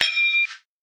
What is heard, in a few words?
noise glass distorted various; soundmorph